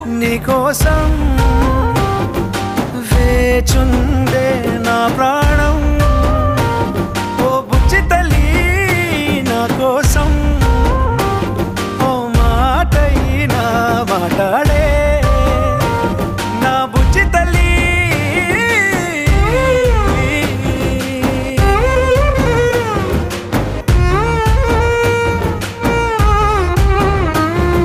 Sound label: Humming; Thandel